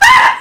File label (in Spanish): grito